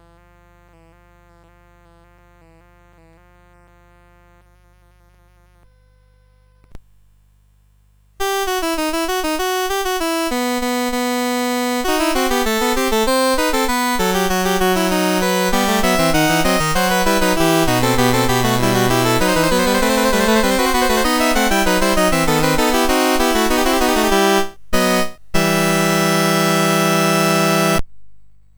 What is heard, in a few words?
Fugue in E Minor, CSG8580R5